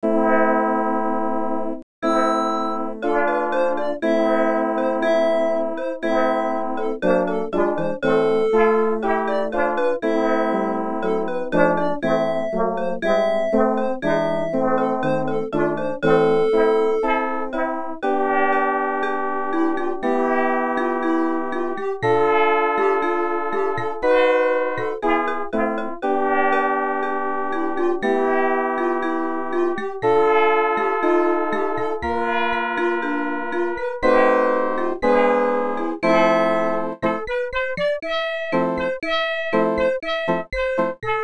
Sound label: Synthetic music, Csound; 天鵝湖